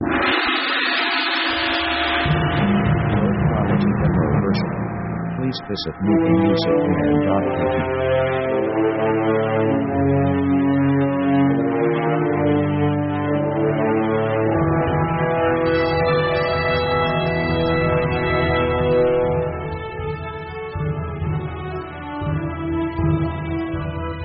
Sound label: orc quint